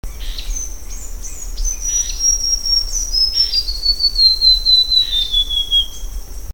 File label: Basileuterus leucoblepharus song